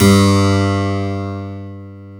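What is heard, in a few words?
harpsichord; Roland - LA Composer vol. 1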